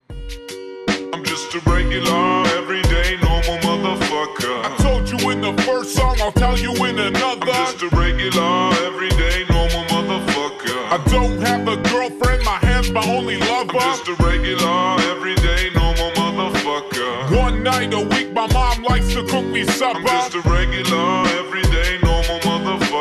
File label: slowed reverb